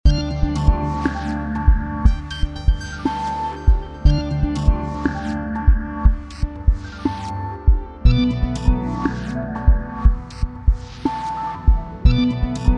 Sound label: Urban transit